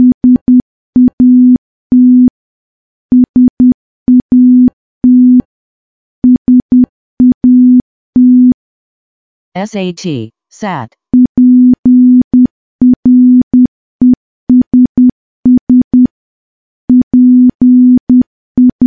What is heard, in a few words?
morse training 10WPM